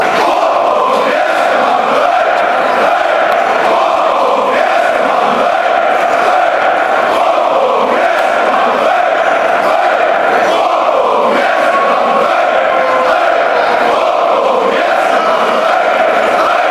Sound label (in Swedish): hoppa om ni alskar malmo, fanchants